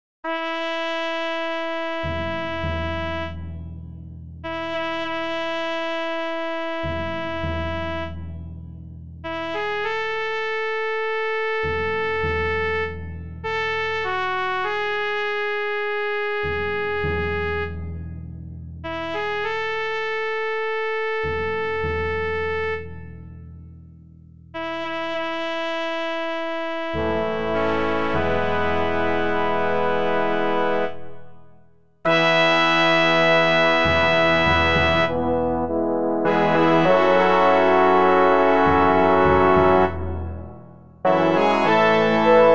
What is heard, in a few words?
fanfare